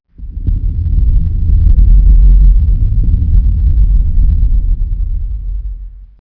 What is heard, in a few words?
rumble low; Max Payne